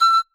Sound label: marimba child; ゆめ2っき